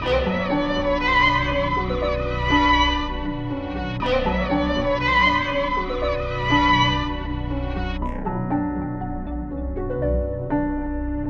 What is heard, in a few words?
Phantom 120 BPM B Min; Cymatics; 2022 Melody Collection